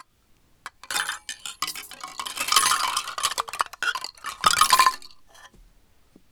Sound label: foley mixture